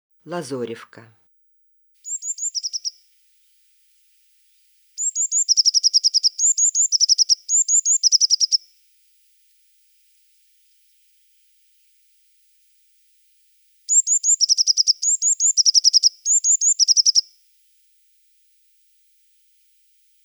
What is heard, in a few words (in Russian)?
obyknovennaya lazorevka golos